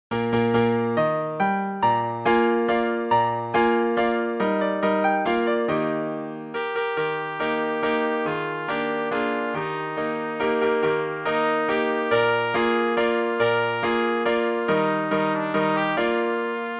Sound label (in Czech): trubka klavir; Přednesová skladba pro trubku; Two German Dances - trubka